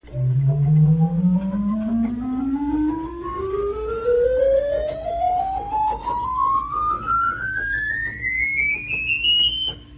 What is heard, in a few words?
rohrflote, organ